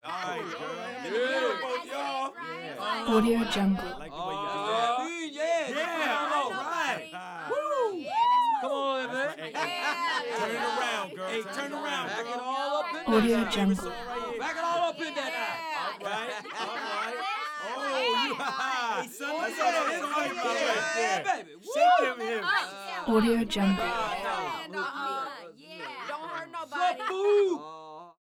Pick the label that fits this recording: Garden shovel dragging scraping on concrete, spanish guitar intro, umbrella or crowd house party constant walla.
crowd house party constant walla